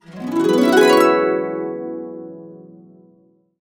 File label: E, Harps; USB Soundscan vol.62; Complete Harps; AKAI